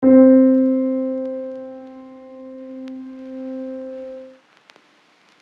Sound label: Old piano C3 sustain on vinyl